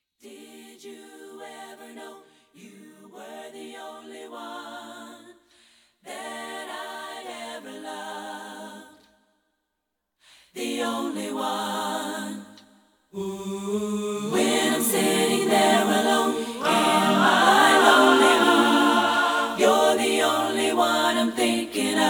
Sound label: A Cappella Intro